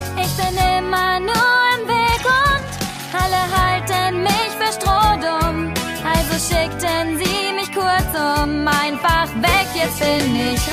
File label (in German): Gesang